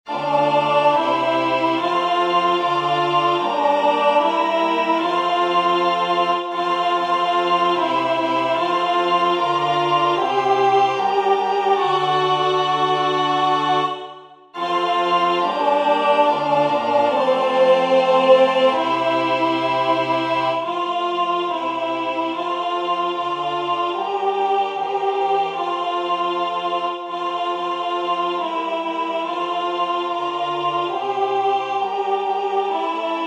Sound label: Agnus Dei alto; singing divine service iii in parts